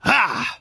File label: Attack; Prince Valanar